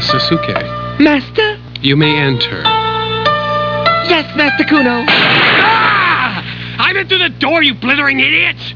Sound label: door; Ranma